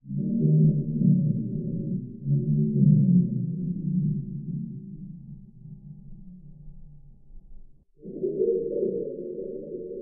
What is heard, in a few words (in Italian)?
tordo; FILTRATI E RALLENTATI; I 7 CANTI